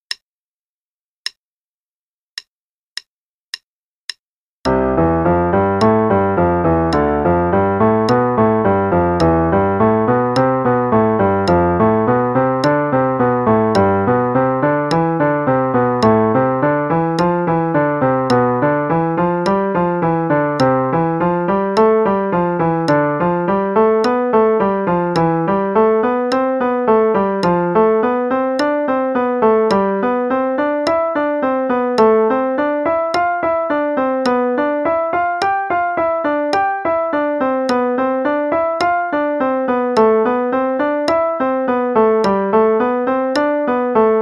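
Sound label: Play-a-long, eighth note=108; Hanon 01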